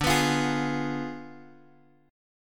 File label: D Diminished 7th D down Guitar DADGAD